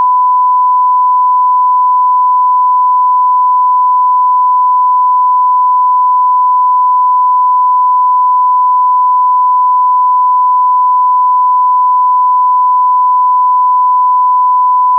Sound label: 1k sine